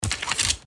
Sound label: Reading bow